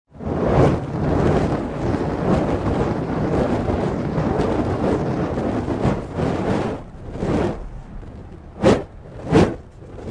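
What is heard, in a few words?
waving torches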